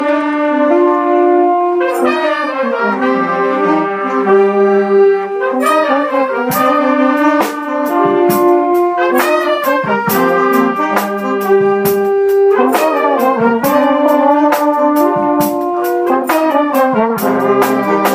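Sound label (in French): Thème Trompette Recrée, brassband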